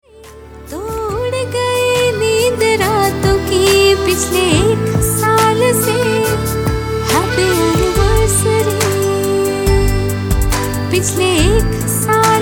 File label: ANNIVERSARY SPOUSE TUNE HINDI FEMALE